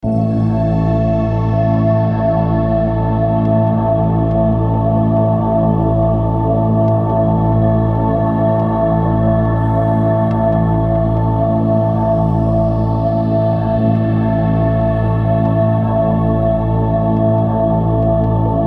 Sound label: Persian Backing Track